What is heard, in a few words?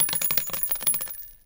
cartridge, battle